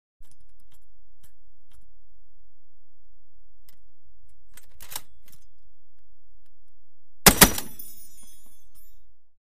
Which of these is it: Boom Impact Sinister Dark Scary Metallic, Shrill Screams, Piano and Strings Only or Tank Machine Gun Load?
Tank Machine Gun Load